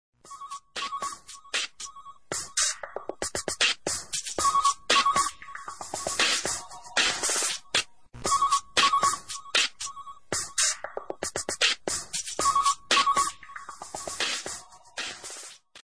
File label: popple tone; samsung phones